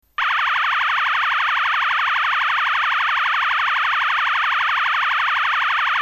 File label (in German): ton, Signalgeraete